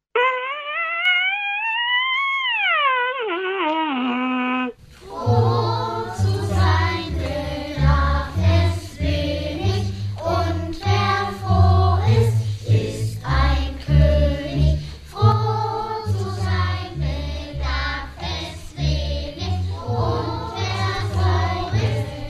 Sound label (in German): Kanon Experimentell; Froh Zu Sein Bedarf Es Wenig